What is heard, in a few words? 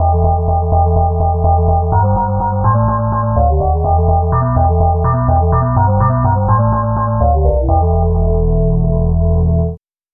bowed glass bass